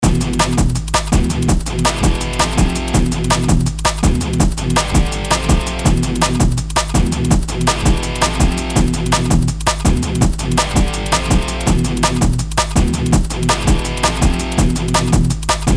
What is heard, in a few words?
battle